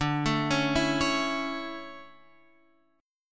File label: D Major 7th Suspended 2nd